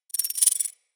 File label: companion collar jingle; Pets; Zanuka